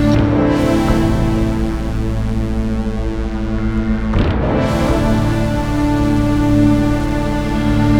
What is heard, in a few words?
SYNTH